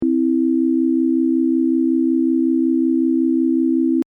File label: C E Just